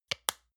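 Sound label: lotion large lid close medium double, hairdresser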